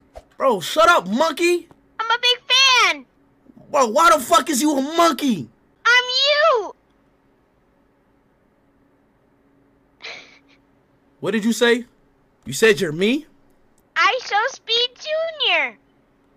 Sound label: fan going crazy